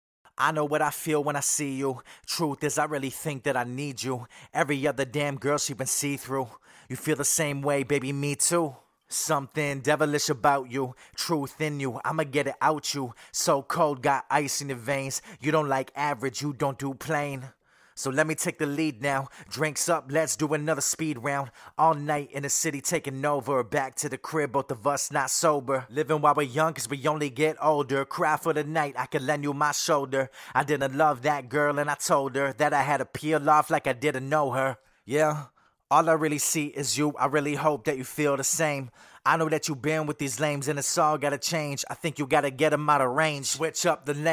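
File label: hip hop, 55, D minor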